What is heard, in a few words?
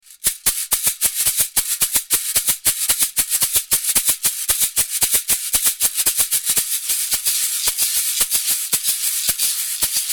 Ganza